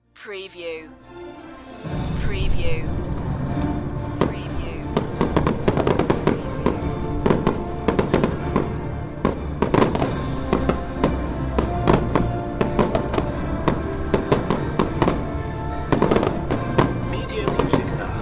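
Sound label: Fireworks Orchestra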